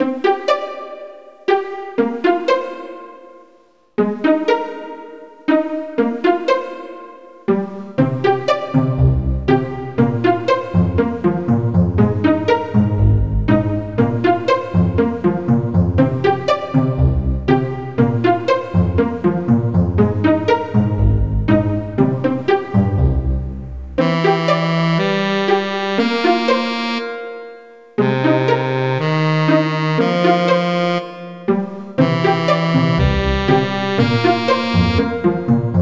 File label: pizzicato, chiptunes